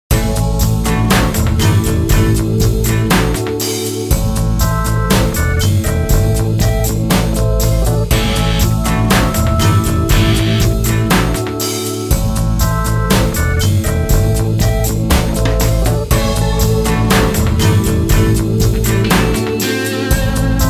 Surf

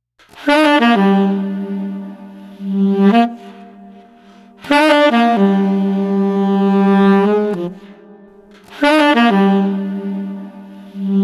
Street Sax Moody

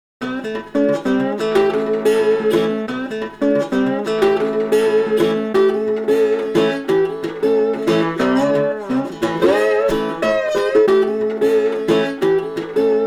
Resonator Guitar Downhome 90

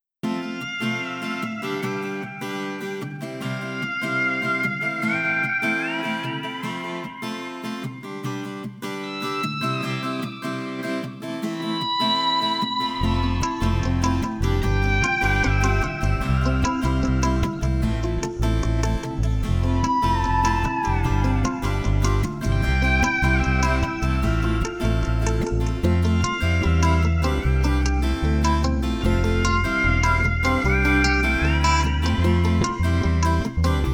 Island Hopper No Drums 150

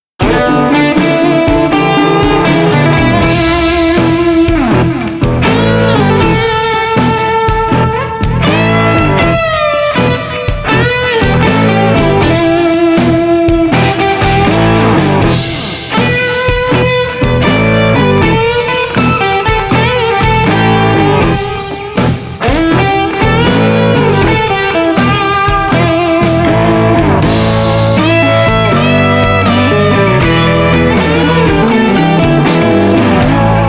Blues Rock Slow 80

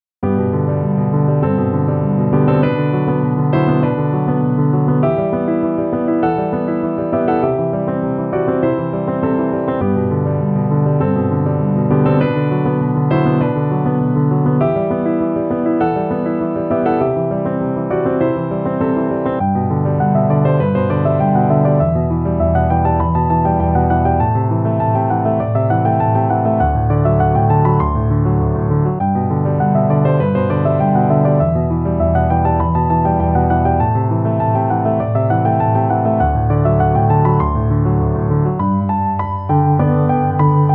Cocktail Piano Posh 100

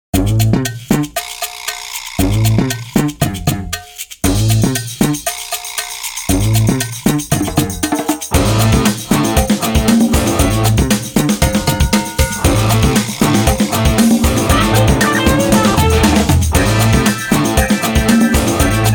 Cowbell 117

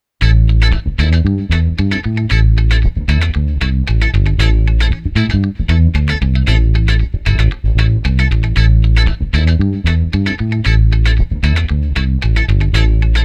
Street Guitars Funky Strut no Congas 115

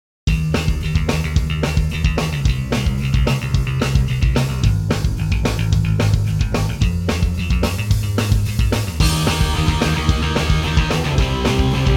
Pop Punk Instr

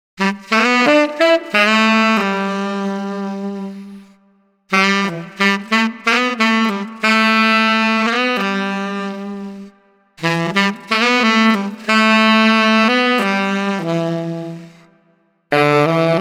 Saxophone Solemn Melody 90

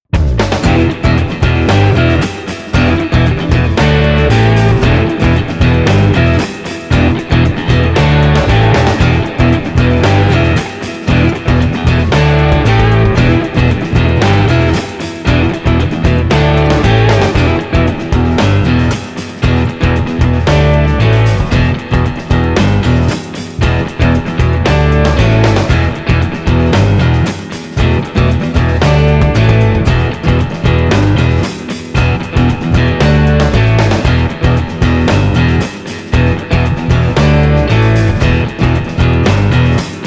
Instr 115

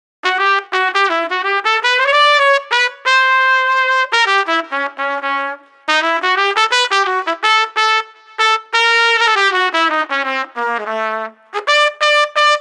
Street Trumpet Hopeful Melody 85

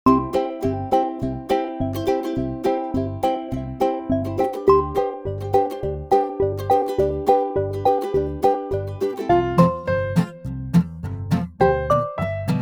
Lively Occasion No Drums

